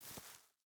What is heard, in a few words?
footstep barefoot grass walk; Rust